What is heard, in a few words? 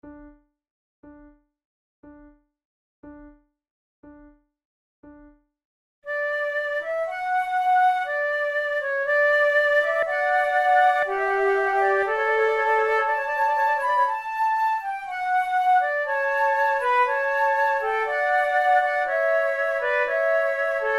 sonate en trio, lentement, flute